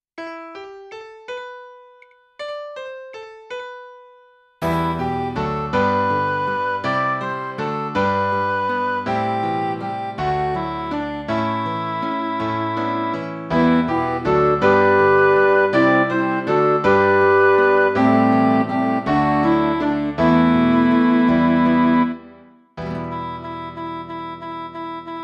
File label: advent chant midi